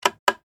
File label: Steampunk Mechanical, Scifi, Steampunk, Mechanical, Push, Button; Science, Fiction; BlastWave